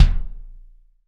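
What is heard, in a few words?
bass drum, Roland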